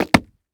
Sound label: Foley Footstep Work Boots Concrete Jump; Foley Footsteps